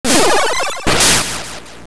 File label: Teleport; Vaati teleporting; Zelda